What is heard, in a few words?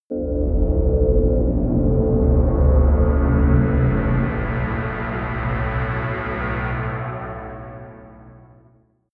large hollow drone deep, drones